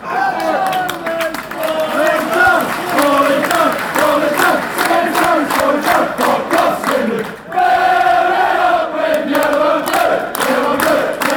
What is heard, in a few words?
swindon town is falling down fanchants